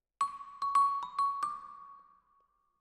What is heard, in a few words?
Vibra Close, Jazz for piano